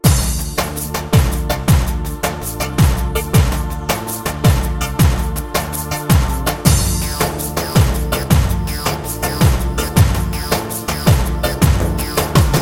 sivas dik halay, ritim; OmeXr Yeni Ritim Demosu (Sivas Dik Halay)

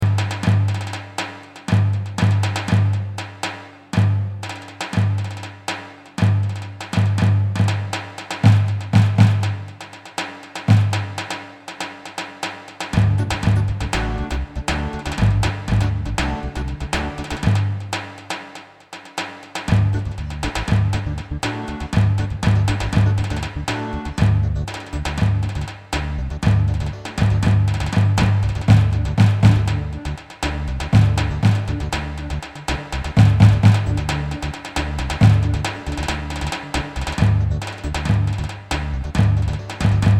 asma davul tonu

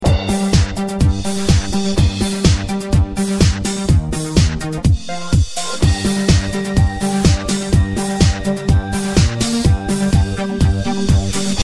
bk-7, roland bk-7m ritim modul

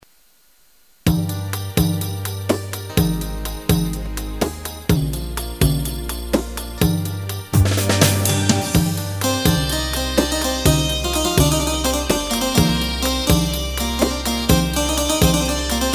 4/4 turku; gw-8 yeni tr set

